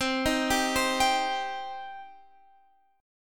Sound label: B sharp Minor, guitar